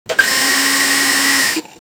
blender with red bull bei; food and drink

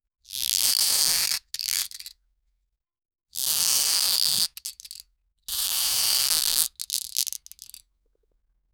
rope straining

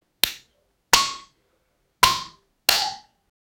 wolf claw slashing

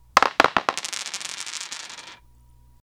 games

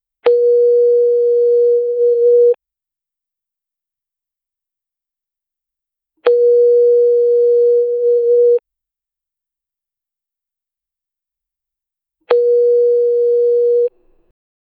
a solitary telephone ring

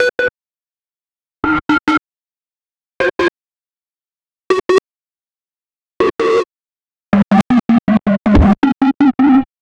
rhythmic; 10 second

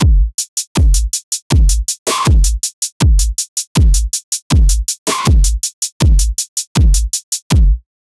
160 bpm dubstep loop

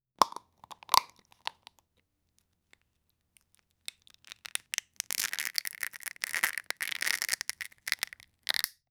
asmr sound of opening a; textures

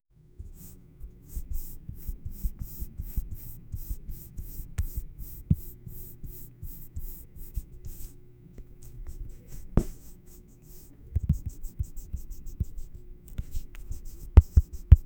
asmr hair scratching

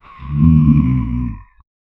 low rasping undead growl

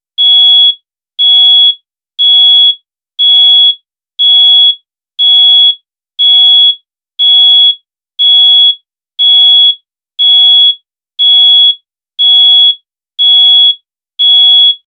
simple alarm for buzzer

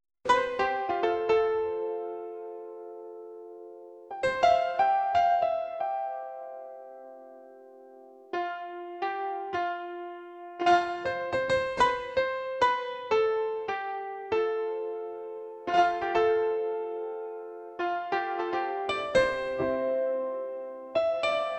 jingle, Brazilian; literatura cordel

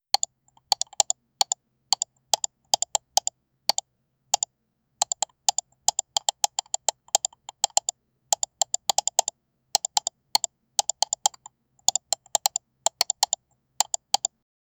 fast mouse clicks; interface

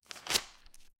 objects

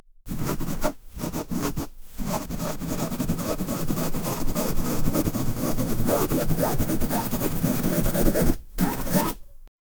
paintbrush dragging on ca, textures

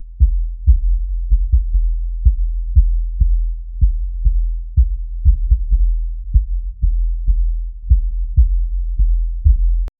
low bass hum heartbeat slo

